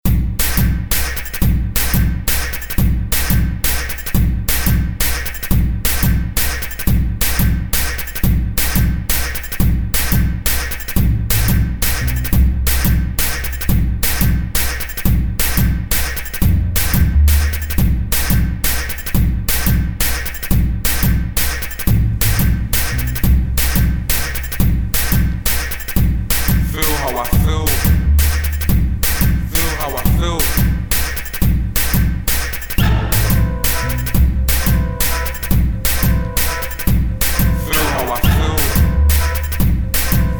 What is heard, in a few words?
vocals